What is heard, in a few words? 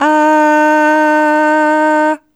Aah Short D#3 Dry